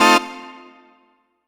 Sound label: Surf Organ; Keys; Dr. Fink's Funk Factory